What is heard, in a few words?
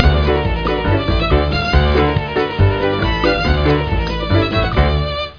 Western Stinger; freddi fish 4 the case of the hogfish rustlers of briny gulch